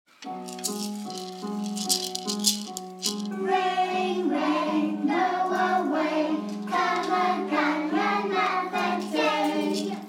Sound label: rain music